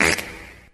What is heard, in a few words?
fart short